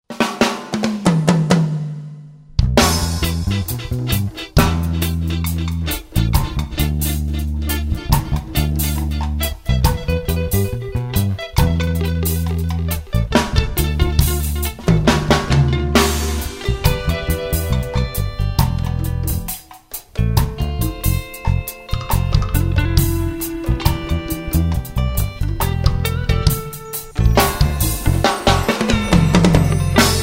viver dub; reggae

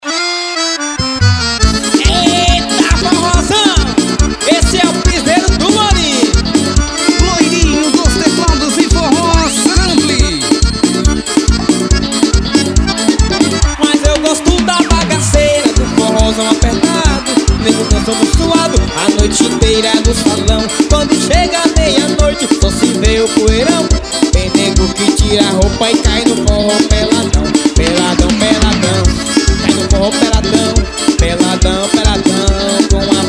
forró

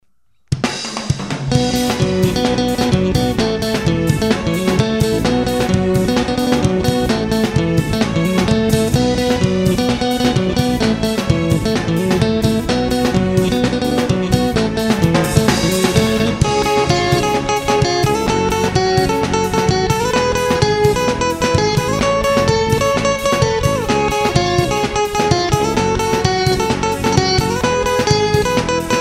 cumbia solado

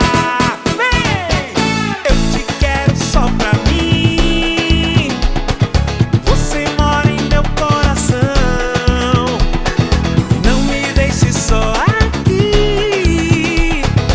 ao vivo axe pout pourri; 30 anos do axe

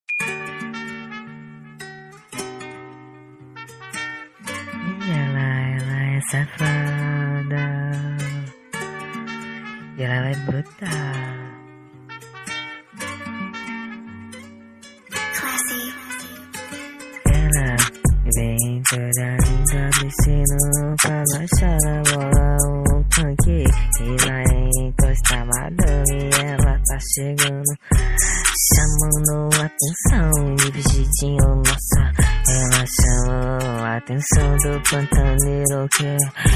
freestyle funk

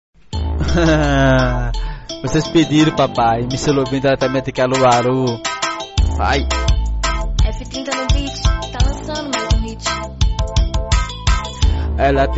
brega funk

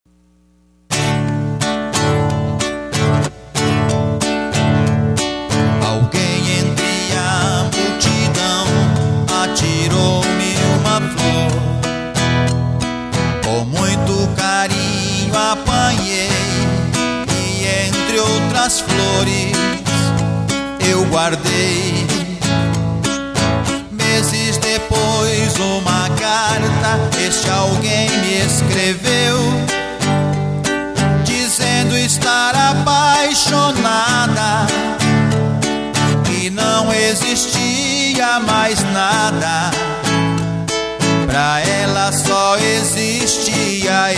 voz violao

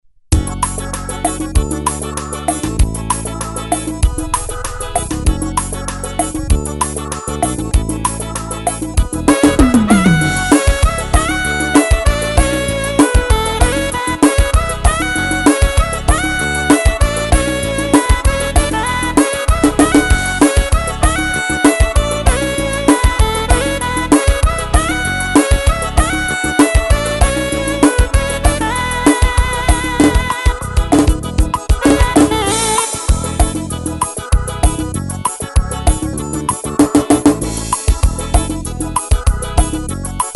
ritmos yamaha forro

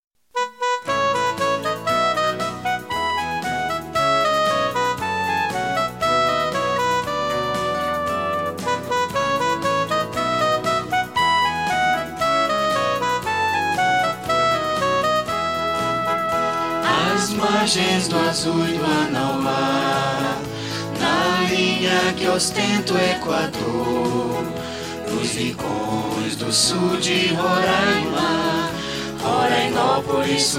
cantado; rorainopolis

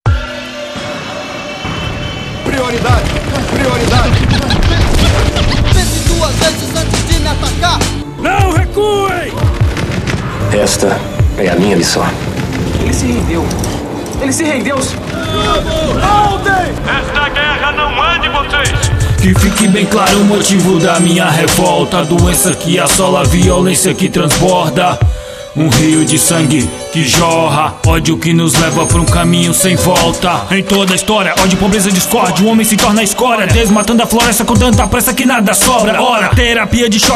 interludio

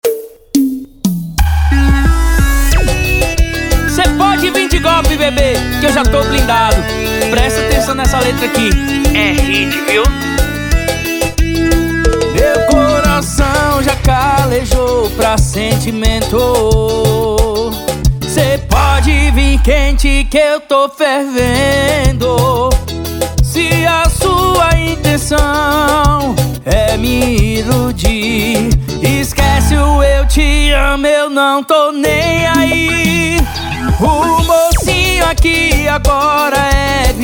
piseiro

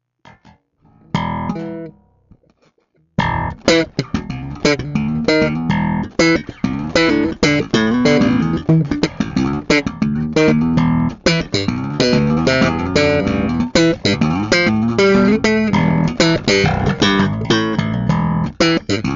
improviso teste

